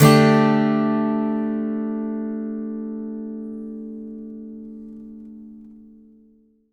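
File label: acoustic guitar D sus down, Whole Lotta Country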